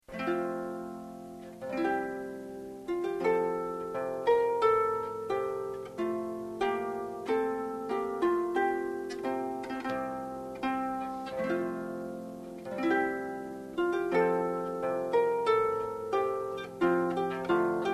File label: trumpet